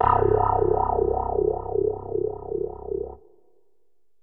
clavi

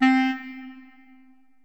sweet clarinet

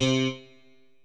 bright guitar

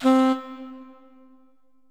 tenor sax

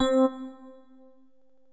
super dx piano

